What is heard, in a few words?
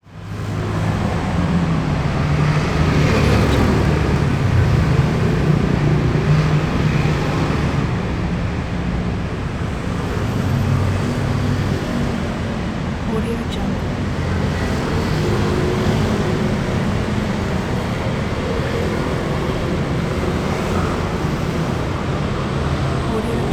taipei traffic busy rush hour buses